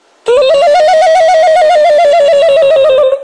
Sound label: Jungle Call